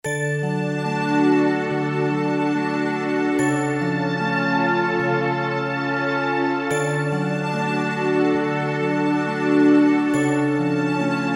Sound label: Mystery, Yamaha TG77; Factory Presets